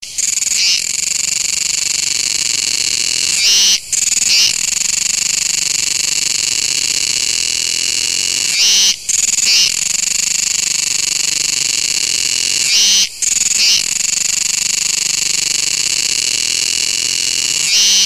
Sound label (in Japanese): kuroiwatukutuku